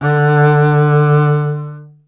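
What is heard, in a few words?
STR CBS, Solo Instruments, double bass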